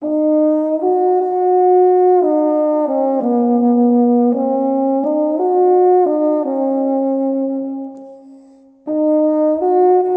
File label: baritone Horn Sound